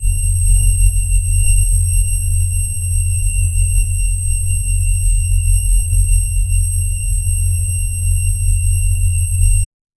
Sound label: looping fantasy prison si